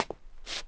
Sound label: Scuff Med; characters